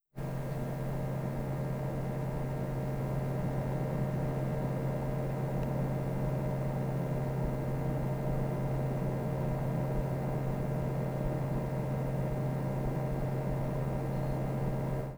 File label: CSK650 noise